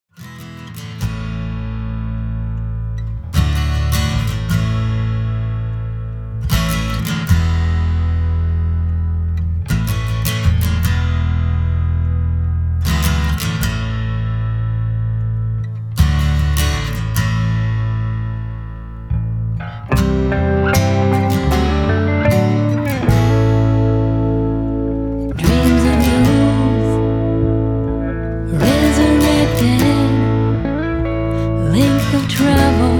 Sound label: Rhythm Heals